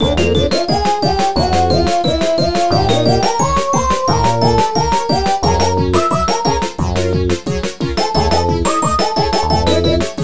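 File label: background music whacky; My Frogger Toy Trials; Nintendo DS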